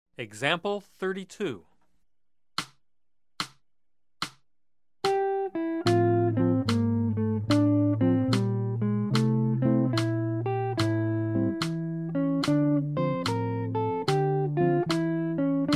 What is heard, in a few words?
Jazz; jue shi ji ta